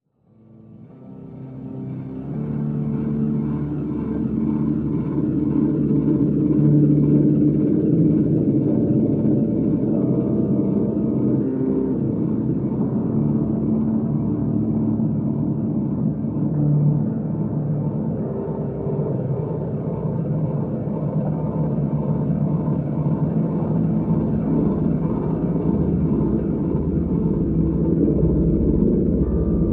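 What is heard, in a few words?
Drone Locust Nest Simple High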